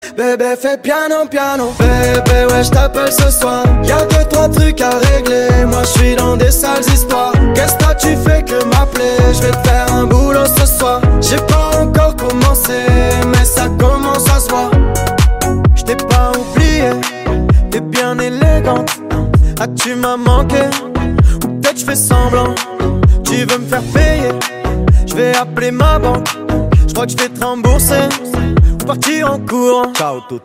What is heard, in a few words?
piano piano